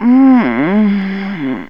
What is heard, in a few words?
SEX VOX